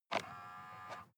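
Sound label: sports car interior side mirror adjust very short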